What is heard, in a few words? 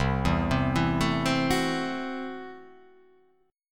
C 6th Flat 5th C down